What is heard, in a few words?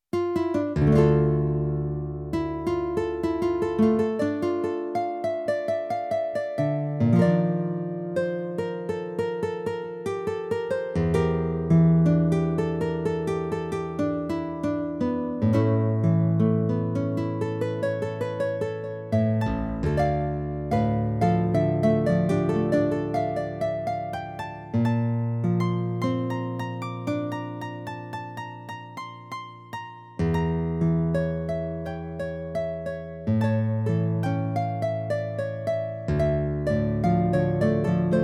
Guitar